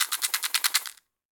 Caxixi FX; Latin Percussion; Drums; Drum Hits; Shaker; Ableton; Factory Packs

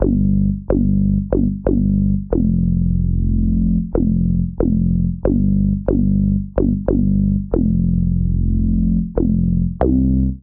Hang Bass G Minor 92 bpm, Bassline; Tonal; MIDI Clips; Drive and Glow; Ableton; Factory Packs

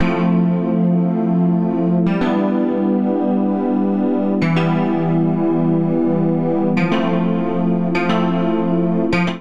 Past Keys C Major 102 bpm; Accompaniment; Tonal; MIDI Clips; Drive and Glow; Ableton; Factory Packs

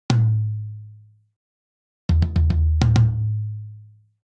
Linear Funk Toms Only 100 bpm; 4 4; MIDI Clips; Ableton; Factory Packs; Drum Booth

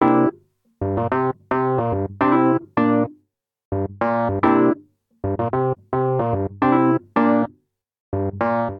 Vamp Funk C9 109 bpm, Electric Keyboards; Ableton; Factory Packs

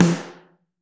SN Mono, Snare

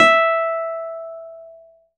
Model-C E4; Keyboards; Ableton Foundation FreePacks

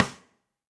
Snare Sidestick WarmAndLow; Drums; Drum Hits; Standard Hits; Ableton; Session Drums Club

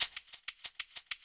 095 HipHop Shaker; Percussion

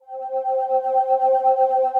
Sine from the Past, Ethereal Pads; Ableton; Mood Reel

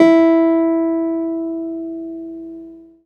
Model-C BD E3; Keyboards; Ableton Foundation FreePacks

